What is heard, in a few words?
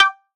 One Shot Piano House Melodic Pluck G Stab Club